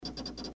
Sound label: fast 5 clicks